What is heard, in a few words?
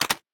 Button Esc